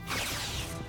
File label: Phasers; Bajoran; trek